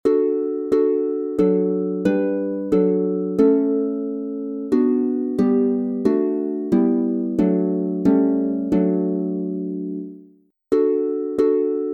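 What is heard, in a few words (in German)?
F Dur 3 stimmig instrumental, Harfe; Es ist ein Ros entsprungen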